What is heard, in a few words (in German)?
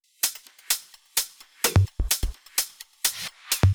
Drumloops Beat 128 BPM ohne Clap; Vengeance - Essential Clubsounds Vol.5; VEC5 Drumloops; VEC5 Multi Loops 128BPM